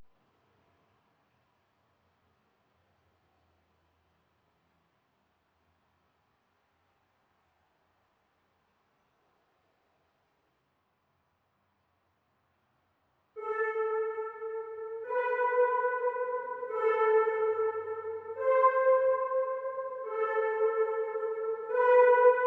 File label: 144 BPM A Min Synth; Trap; Melodies; Loop Stems; Cymatics; Trap Starter Pack; Betrayed